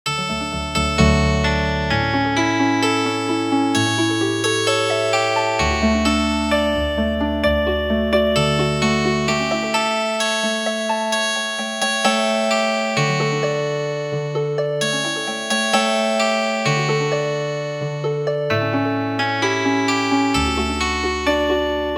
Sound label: Healin Harp; Hinten bei der Stadltur